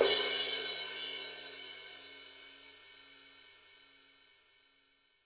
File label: HiHat & Crash, Roland TD-20; HiHats